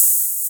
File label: open hihat; Techno Trance Essentials; DRUMS